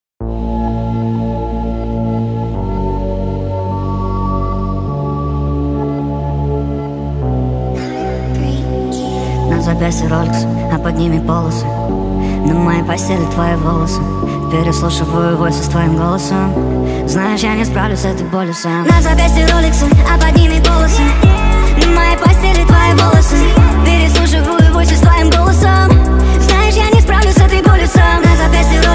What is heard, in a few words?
speed up